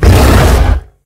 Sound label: gorilla; silverback